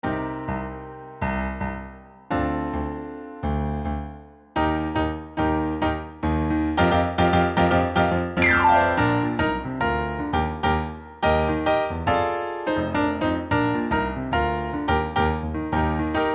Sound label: accompaniment